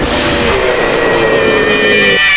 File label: ANIMATRONIC SCREAM; Five Nights at Freddy's; FNAF 4